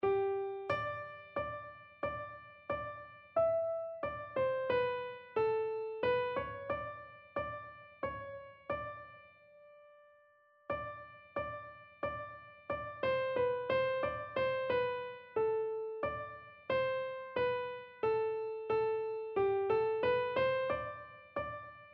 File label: let all together, keyboard